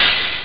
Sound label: acid hihat; Amiga Soundtracker Sample Packs